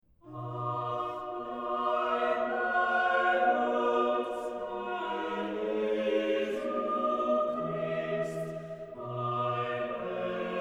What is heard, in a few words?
Chorale, ORGAN; BWV0253; Ach bleib bei uns, Herr Jesu CHrist; BACH; BACH ORGAN WORKS; CHORALE PRELUDES